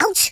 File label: ouch; Russki